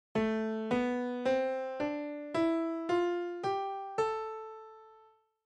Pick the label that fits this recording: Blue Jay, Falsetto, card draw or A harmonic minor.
A harmonic minor